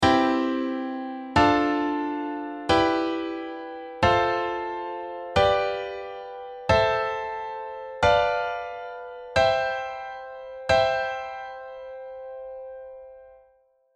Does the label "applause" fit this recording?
No